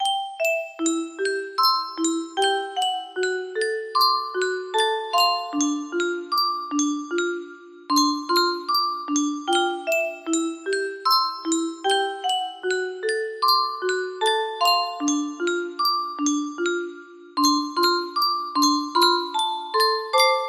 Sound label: a music box